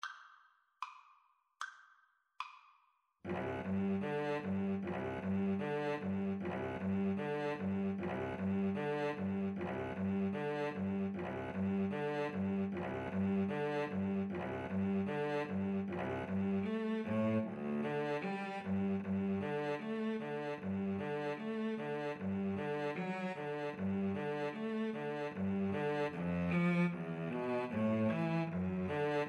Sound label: Cello Duet